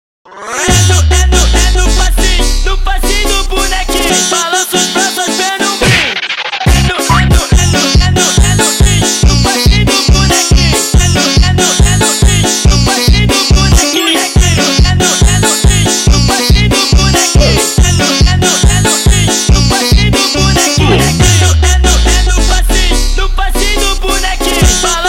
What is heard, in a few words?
Funk sped Up